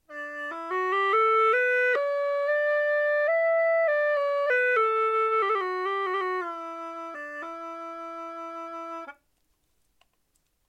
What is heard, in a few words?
hulusi